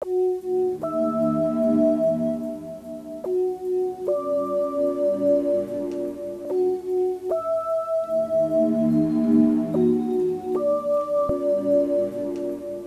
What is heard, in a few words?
loop; zoombie grammar game